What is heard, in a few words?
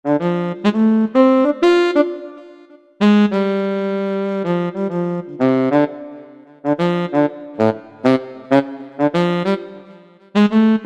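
Tenor Soft, reed, Yamaha EX5R; Factory presets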